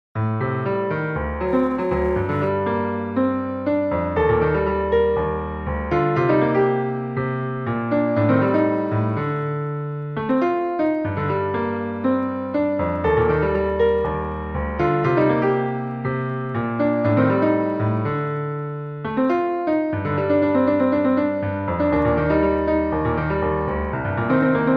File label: noty fortepiano